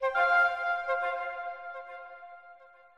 Musician Rebloom Flute

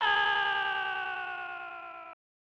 Fall (Male); Steven Seagal Is the Final Option; prototype; snes

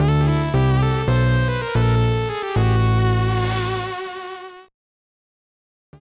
Jingle; Digimon Racing; GBA

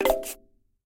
Buttons

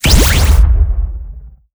Banshee Hit

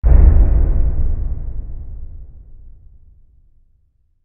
Subwoof; chivalry medieval warfare 2012 pc

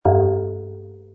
Hit Stone Kuppel

